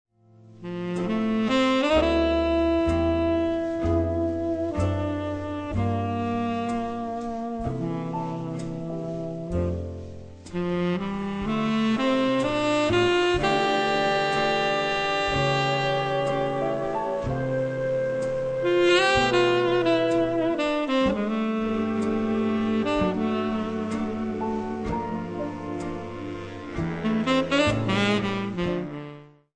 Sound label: st8 Ballad 60bpm, grooves; teaching listening